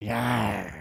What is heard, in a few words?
Walk, creatures organic